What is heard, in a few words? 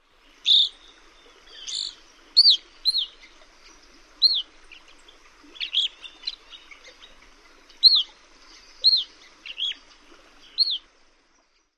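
Evening Grosbeak calls of individual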